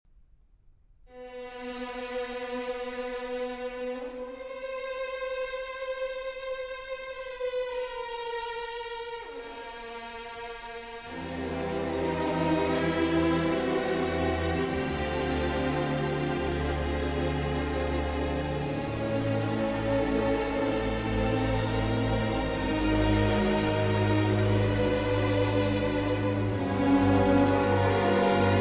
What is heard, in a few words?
Symphony in D Minor, Adagio, Langsam; Symphony No. 9; WAB 109; Original 1894 Version; Feierlich